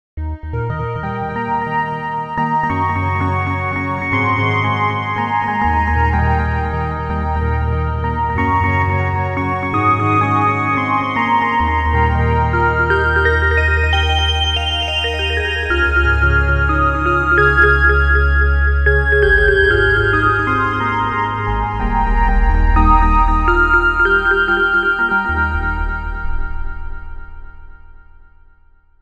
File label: space organ, synth; briandc synthv1